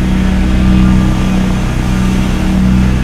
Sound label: Circle Laser Loop